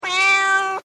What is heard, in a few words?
Animal Cat Tabby Meow; Domestic cats; Cats (Sound Effects:Animals)